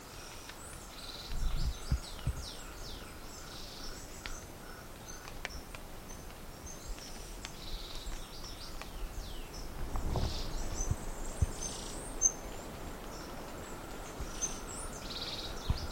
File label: slaty brush finch